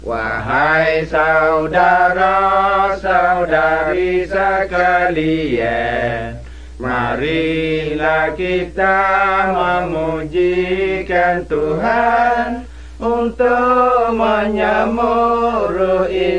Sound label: songs malay pattani